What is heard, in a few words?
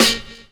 SD TONE, snares; E-Lab; X Static Goldmine 2; akai